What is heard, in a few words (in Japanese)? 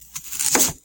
yasai cut